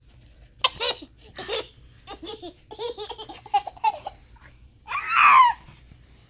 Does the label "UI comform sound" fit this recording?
No